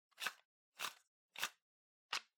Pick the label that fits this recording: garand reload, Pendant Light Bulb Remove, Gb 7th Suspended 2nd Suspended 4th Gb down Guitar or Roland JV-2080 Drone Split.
Pendant Light Bulb Remove